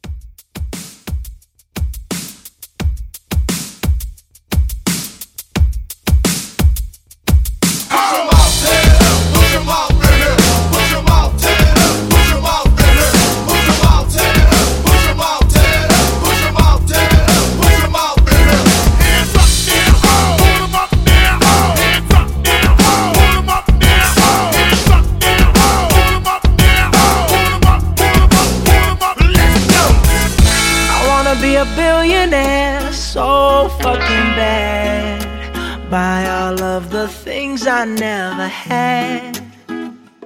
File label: Throwback Hype Re Drum 87